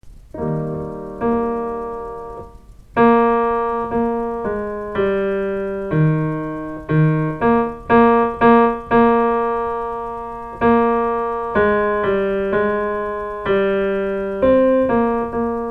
tenor stemmen; stemmefiler; Abide with me

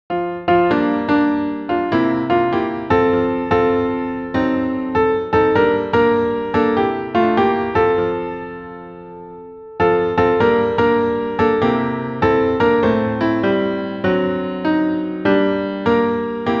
alle stemmer

